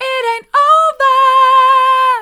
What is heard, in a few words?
it an't over soft, vocal